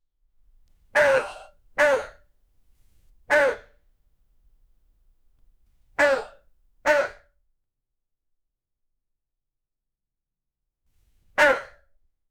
loud pow from high to

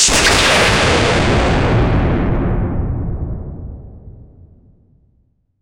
a big collasion

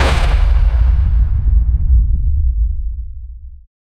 an extremely heavy hammer, impacts

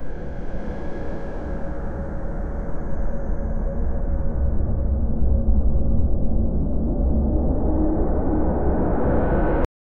wind

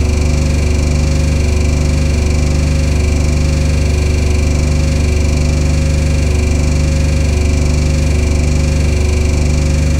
Hino P11 diesel truck

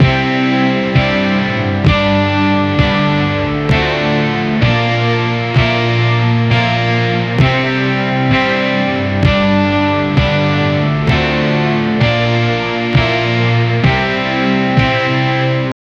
trap pop rock love sad

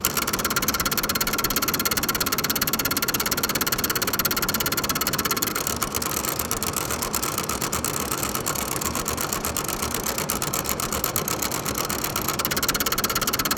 ticker tape machine ticki

